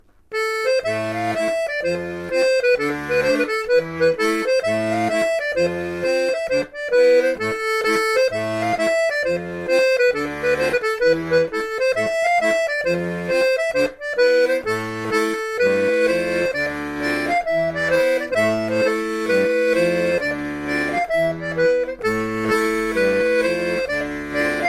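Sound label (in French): laride, accordéon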